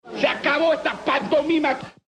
pant

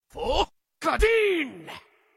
frost breath

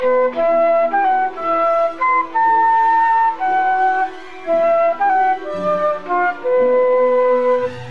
flute

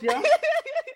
hihihi

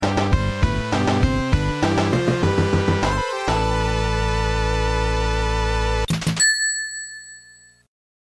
stage act clear with cha ching ending; sonic the hedgehog

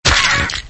knife death; mad murder

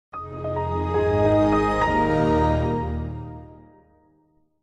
microsoft windows xp startup sound

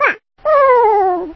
death

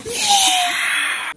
yaaaaawn

